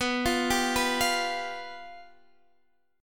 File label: F Suspended 2nd Flat 5th B